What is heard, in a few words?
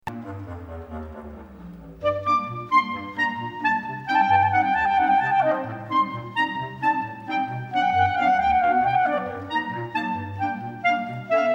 Czardas, clarinet ensemble